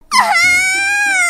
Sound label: uwaaaaaaaan